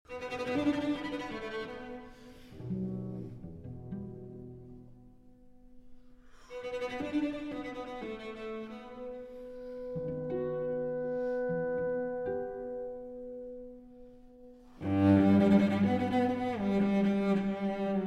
Cello Concerto in E Minor; Elgar; Op. 85 - 2. Lento - Allegro Molto

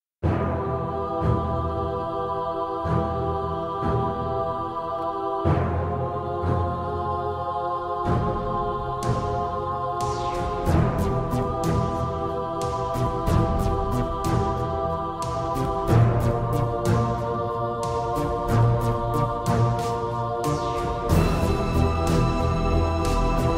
Epic Alien Music

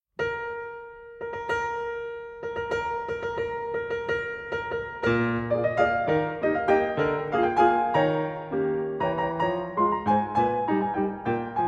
Grand valse brillante in E-Flat Major

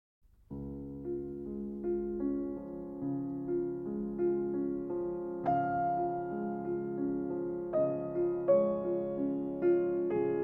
Nocturnes, Lento sostenuto in D-Flat Major

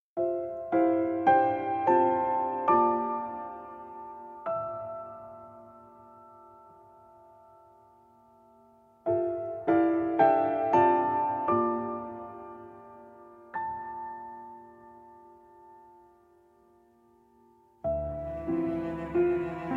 Nocturnal Mind in d Minor, Piano Septet Version

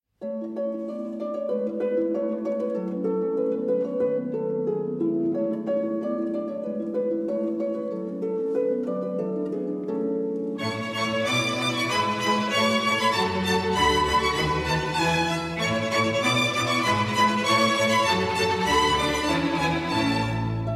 Harp Concerto in A Major III. Rondo. Allegretto

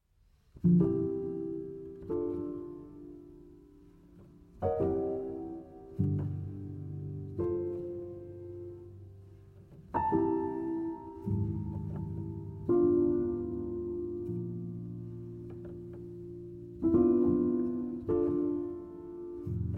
Harmonica, Upright; Upright Piano; Kurtág

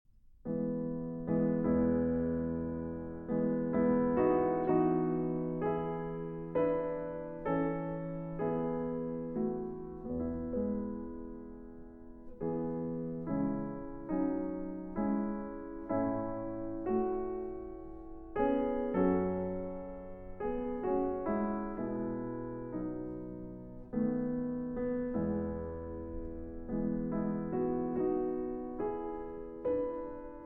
Nocturne, Transcr. For Piano; Mendelssohn; A Midsummer Night's Dream, Op. 61 VII.; Moszkowski

